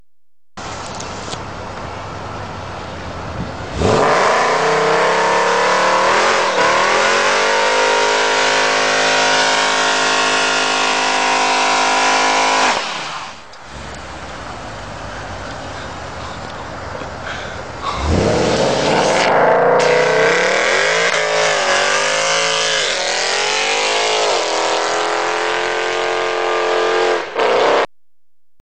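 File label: engine sound, Mercedes-Benz C63 AMG; Zvuk dvigatelya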